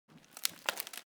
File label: object wood bing break light; smartsoundfx